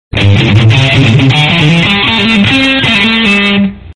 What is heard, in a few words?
iphone 3g s electric guitar ringtone